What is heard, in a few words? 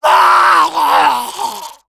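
pain critical death, French; Medic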